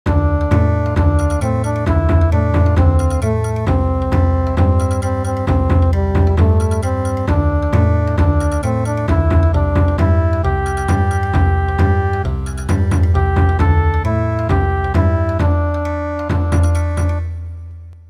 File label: Bon Odori